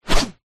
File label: warfare axe or other heavy weapon swing